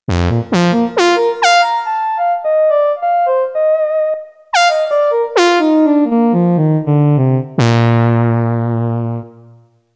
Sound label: Synth